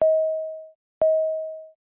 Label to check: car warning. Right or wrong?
Right